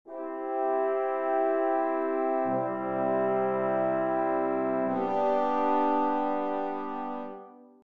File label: brass